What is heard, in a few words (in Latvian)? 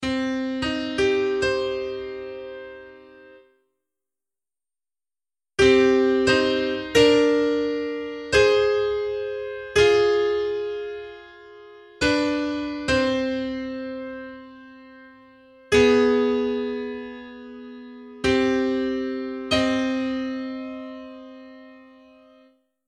intervalu; INTERVĀLU SECĪBA